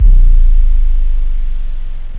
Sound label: jungle; ruffbass